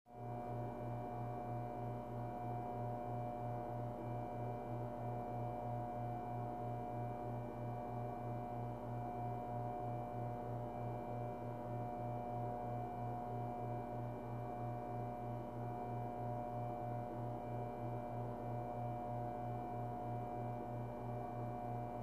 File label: Machine Hum Ventilat, air fill